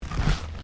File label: Footsteps Carpet Step; Crocs; Footstep Foley; Footsteps (Sound Effects:Human)